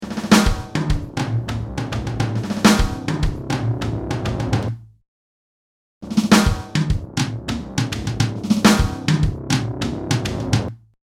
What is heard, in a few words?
Gated Drums